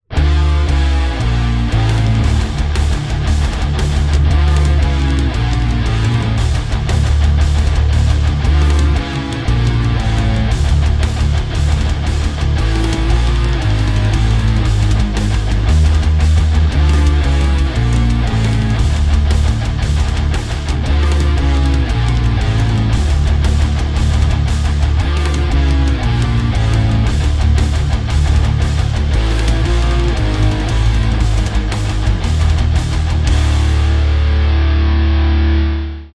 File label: hard rock